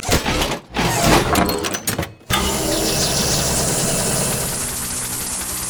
recall lead; LoL; blitzcrank skin07

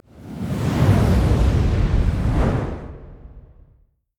summonerspell base exhaust; LoL

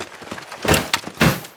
dance loop; LoL; twitch

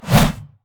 basic attack sword; rengar

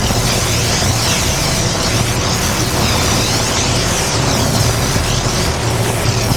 champion channel loop; odin

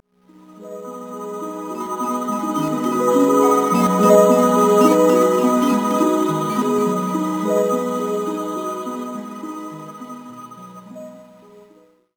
melodies; sona; paxsona

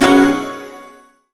guqin basic attack; LoL; guqin sona

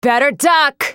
spell cast effort, en US; Sivir

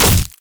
basic attack; leona

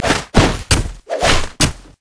recall windup; LoL; ziggs snowday

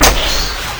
edge hit; LoL; xerath base

